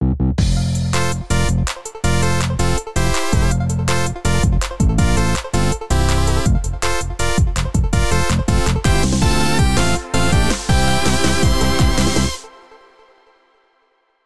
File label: Loop intro (With Reverb Tail); Bopl Battle; The Bopl